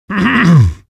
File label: fish cough; Half-Life 2 Lost Coast